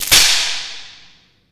Electric Arc, Spark, Large Discharge; arcs & sparks

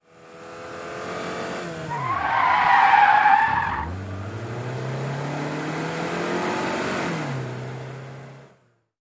Sedan 180 Rev Skid Mx

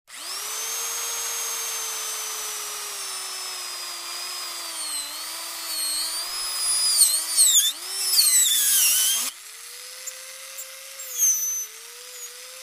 Drill Electric Brok; drills

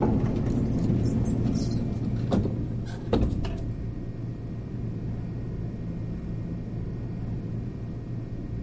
Elevator Door Close

